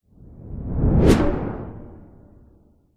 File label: cinematic whoosh fast into drone dark impact; sound design